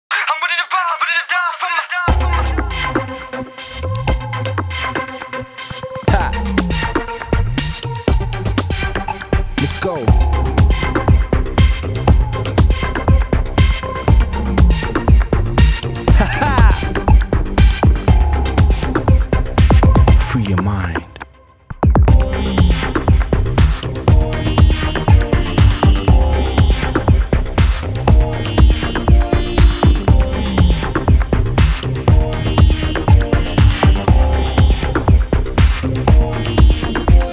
Electronic; Techno

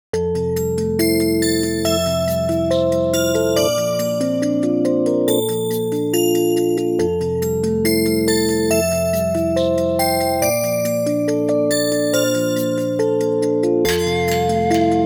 Bird

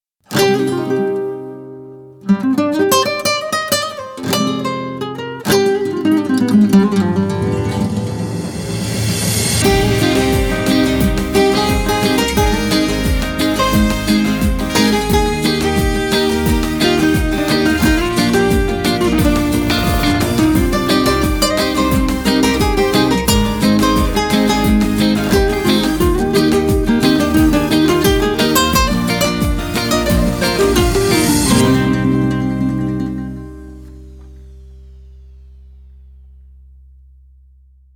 Reggae; 라틴

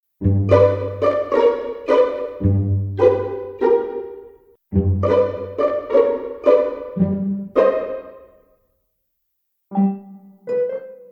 Secret Step, Comic Slow

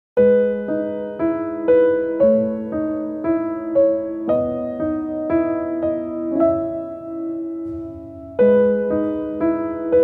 Sleeper, 감성

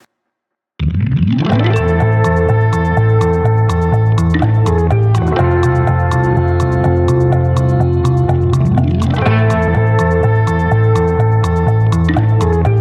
Retro Ground; 예능BG

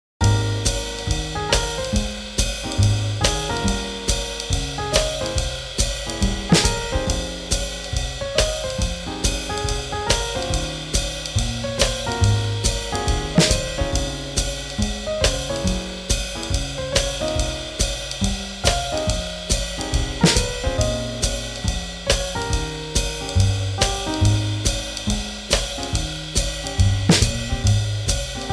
Swing Jazz

